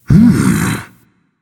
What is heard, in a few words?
notice; smite; voicepack; guanyu